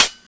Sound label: janus3 clipout; cstrike